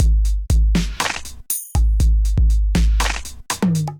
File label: Kit, Drums Hybrid; Golden Era Kit; Ableton; Factory Packs; Drum Essentials